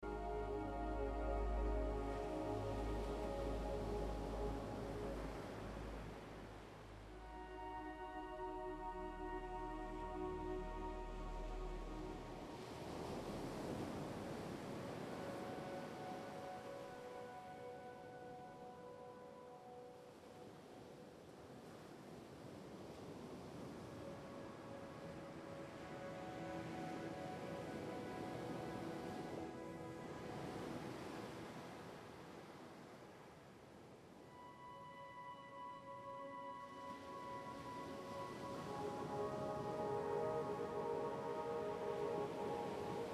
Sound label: soft Voices with Ocean Sample